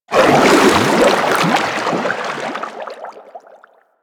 roll whoosh; Warframe; Vehicles; Sharkwing

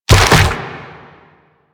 Proto Infested Mutalist Speaker End Flesh; Warframe; Abilities

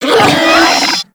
Infested Charger Death, Enemies; Warframe

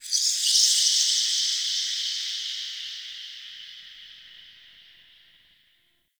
Under City High Creature Echo

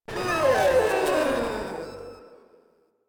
Proto Infested death; Warframe; InfestedNinetyNine

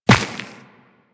Run Dirt, Footsteps; Warframe; Tenno

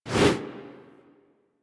Generic Weapon Aim Transition In; Warframe; Weapons

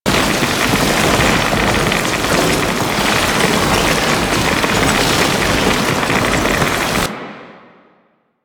Archgun Charged Hold Loop; Warframe; Archwing

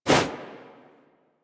Generic Weapon Light Aim Transition In; Warframe; Weapons

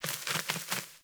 Sparrow Four Wing Flaps; Animals

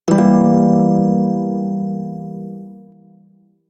Prime Shawzin Phrygian Chord C; Music; Warframe

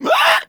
Infested Runner Pain; Warframe; Runners

